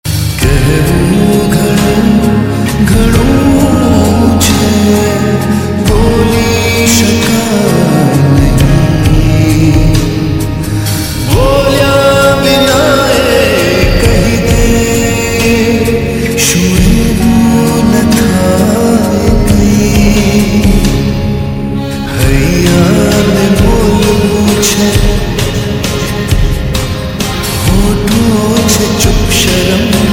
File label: lofi gujarati ringtone